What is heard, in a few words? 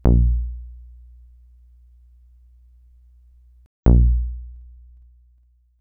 VCF VCA